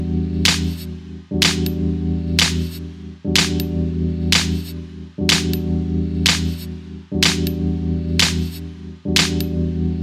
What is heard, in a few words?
Professional Ringtone